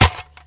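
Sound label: grass; Mafie 1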